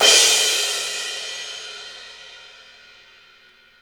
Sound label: cymbal